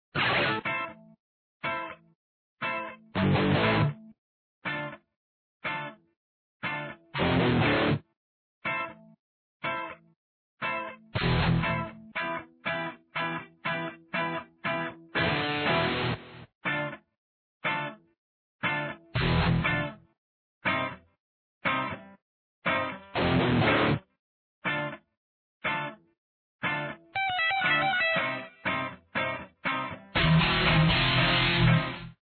Red Electric Guitar - Black Electric Guitar - Pink Electric Guitar - Blue Electric G